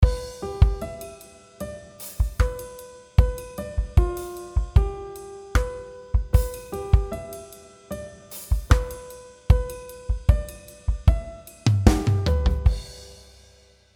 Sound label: mel variation; develop your musical ideas